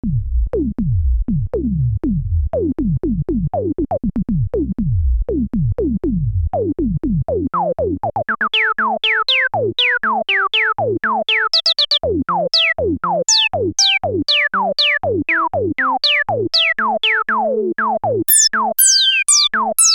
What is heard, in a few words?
yamaha an1x filter lpf24 q100